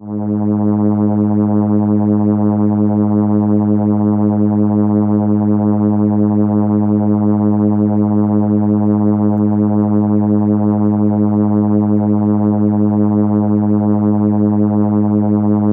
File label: Cello G#3, ARP Solina